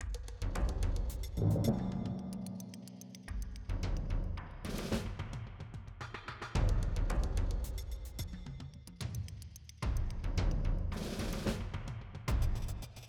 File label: Garden Track(PERC)